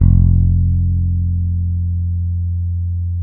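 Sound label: fretless bass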